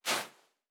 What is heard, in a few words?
Footstep Sand Walking